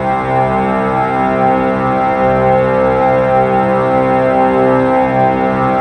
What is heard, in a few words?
grind pipe, foggy drones; Spectrasonic Distorted Reality 1